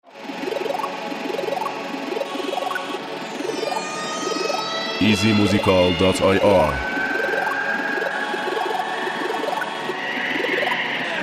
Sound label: Beat